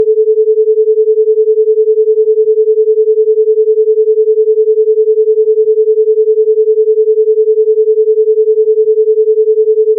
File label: Binaural beat